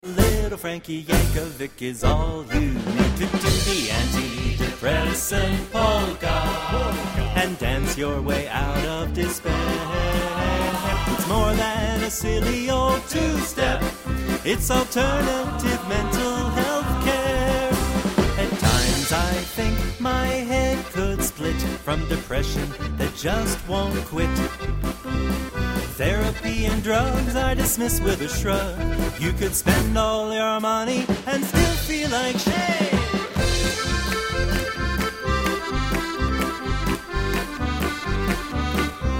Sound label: POLKA